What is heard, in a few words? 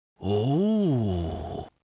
red panda excited; netent